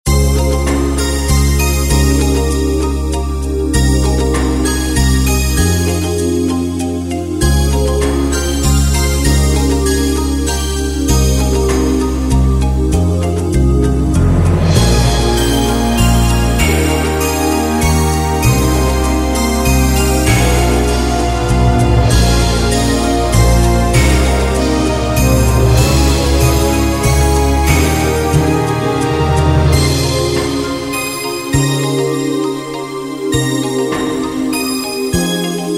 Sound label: Symphonic Forest